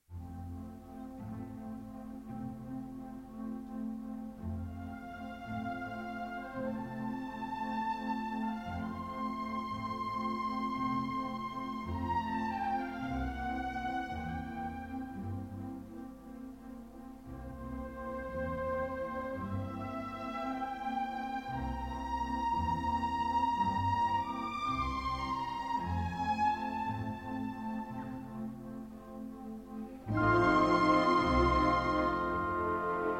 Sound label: Piano Concerto in C Major, Andante